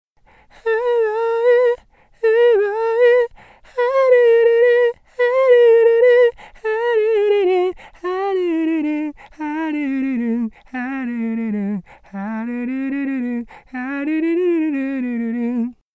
vocal

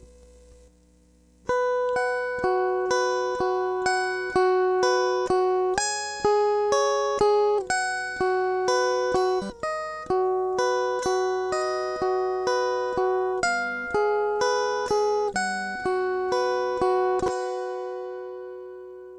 guitar

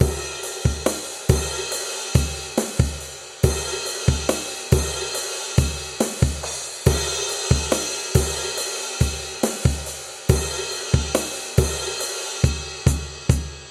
drum

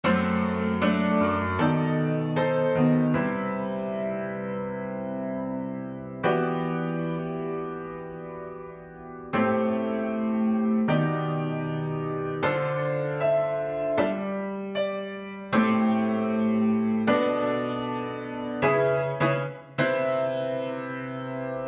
piano modal

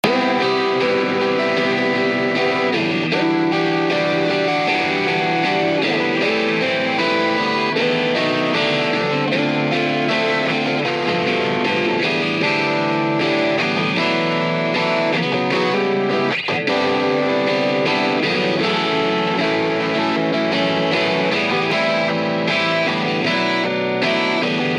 guitar

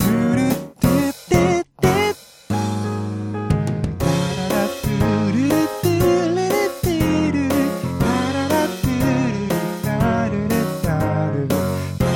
last bass

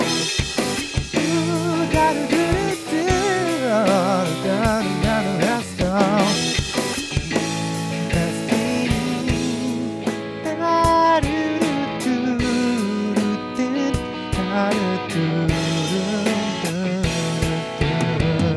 no b drum

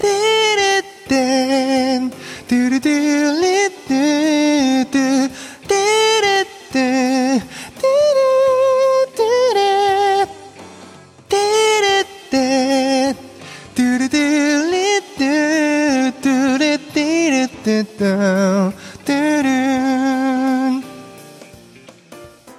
start rev plate

